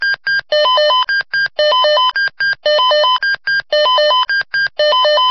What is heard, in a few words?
Swissphone Quattro XLSi